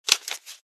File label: Banknotes count out into hand